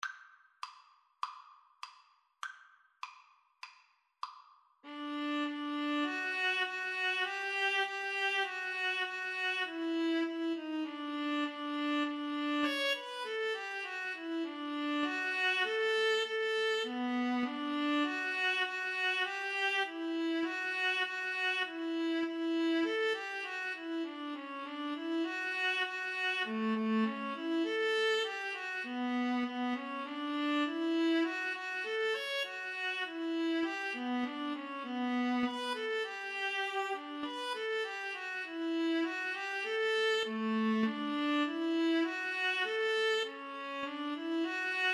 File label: national anthem russia, violin viola duet